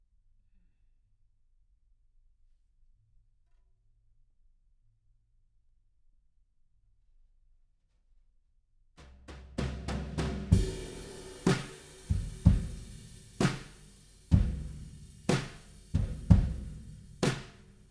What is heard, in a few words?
Room A